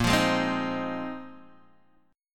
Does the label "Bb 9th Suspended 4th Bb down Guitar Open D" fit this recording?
Yes